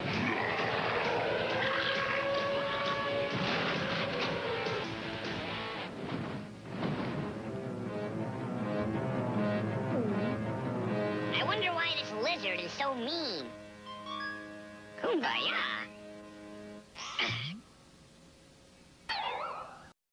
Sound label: eek